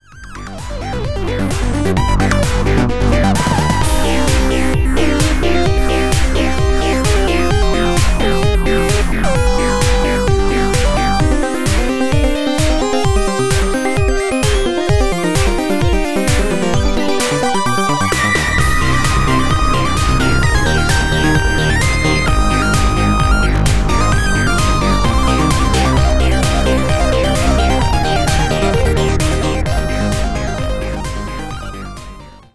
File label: mother land dance